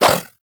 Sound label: Infested Throwing Star Whoosh; Weapons